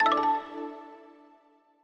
Chimes, sound scheme; Longhorn 7ista Sound Scheme by David Mounder

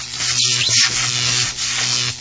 electricity; Junkbot

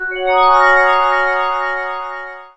match huge, chime; Audiosurf; chillout

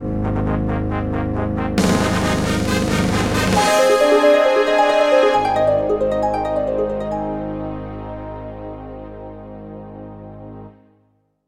fanfare; ROTT Music

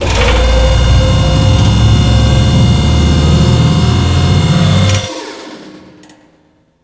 Lift; Princess & Conquest v0.20.06

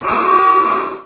awooga; Insaniquarium; popcap

